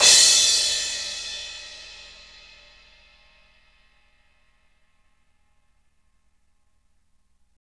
Roland MV8800, crash; RIDE CRASH

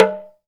TALK DRM, Heart of Africa; Spectrasonics

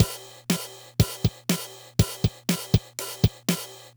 Loop, dd-5

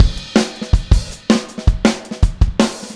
Full Mix, Drums, 162 BPM; Verse

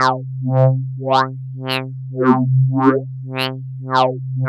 Synth

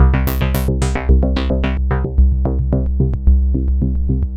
MODU C, Modular Synth Loops

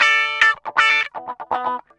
GUI, LICKS; 120 LICKS

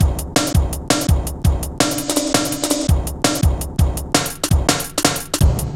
Bouncy, Jungle, Drum Loops 166.5

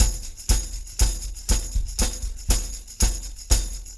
120 TEARS bt1 + tam

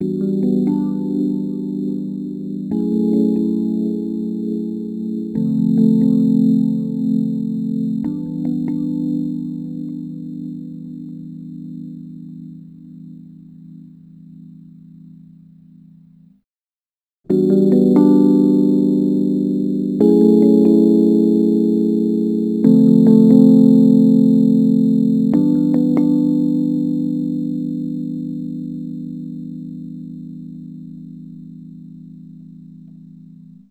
Rhodes Attacks; Ueberschall

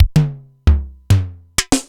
Simmons SDS-9 SDS9 Factory

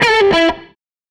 SG Guitar Loop

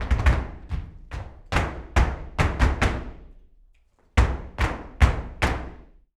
flamenco tapping, alegrias; ProSamples vol.46 - Flamenco

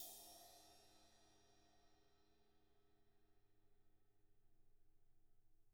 BRUSH CYM, Drums; ILIO; Double Platinum Drums 1

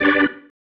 Stab, Organ, One Shots

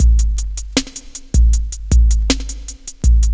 BEAT, Hip Hop; E-MU Formula 4000 Series Vol. 1; Hip Hop Nation; Beats 59-88bpm

